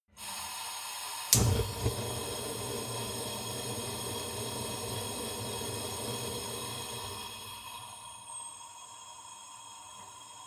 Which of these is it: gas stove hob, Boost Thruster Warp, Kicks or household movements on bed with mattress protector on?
gas stove hob